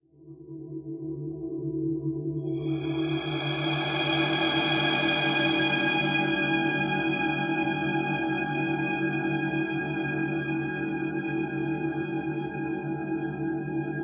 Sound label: Space Metallic Screech Sci Fi Drone Fast Attack Long Decay